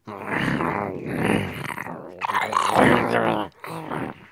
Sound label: Zombie; Blind Survival